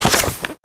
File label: book